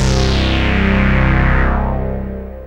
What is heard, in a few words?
FAT SYNTH, BASS; SoundFactory; SMPMANIA